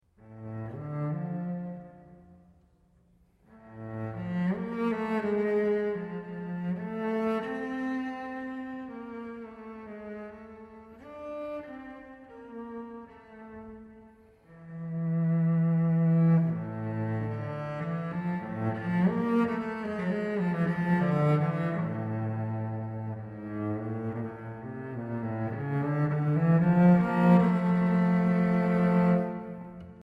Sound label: solo for cello